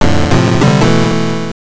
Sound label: Lose Life; Jurassic Park 2: The Chaos Continues; GB